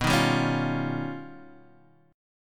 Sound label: D sharp Augmented 9th B